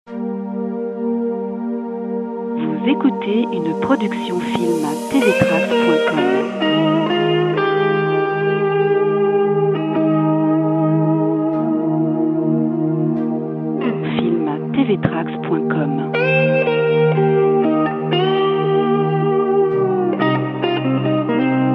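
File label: Guitar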